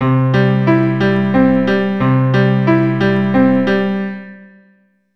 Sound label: C Arp pattern